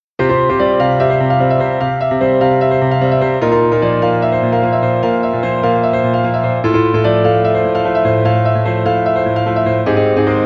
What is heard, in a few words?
piano